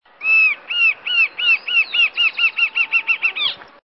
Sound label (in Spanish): voz choca corona rojiza